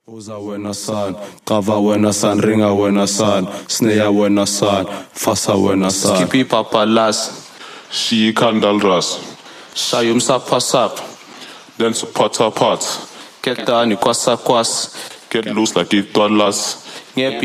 acapella

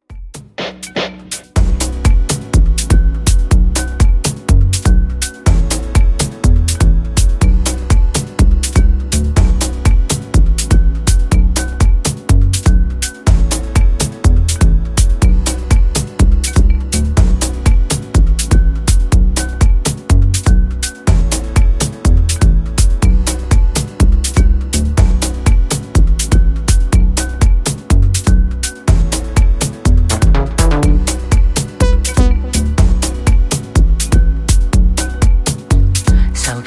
dub

